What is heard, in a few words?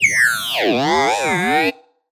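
Sci-Fi Droid Robot Emote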